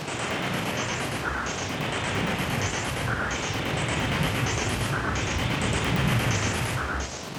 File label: Moving Noise 130, Tempo Loops 130bpm